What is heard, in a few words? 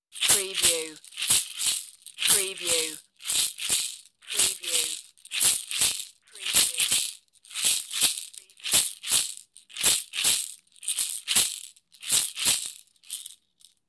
Caxixi